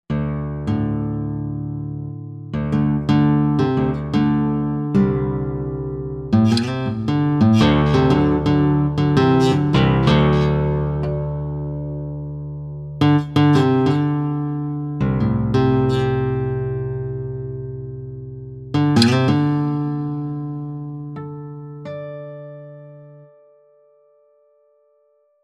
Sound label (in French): CORDES A VIDE